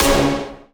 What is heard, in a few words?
punch C#5